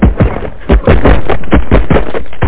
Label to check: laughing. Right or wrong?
Wrong